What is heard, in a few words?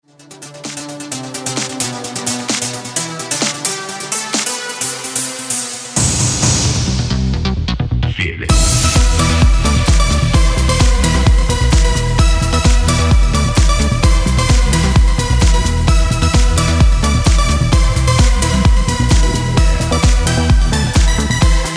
dance music